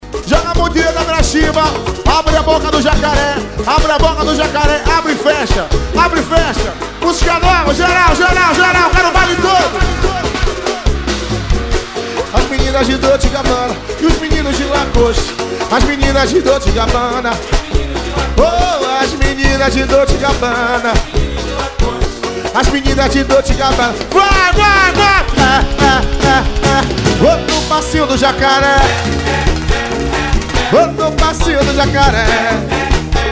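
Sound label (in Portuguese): música de política no passo do jacaré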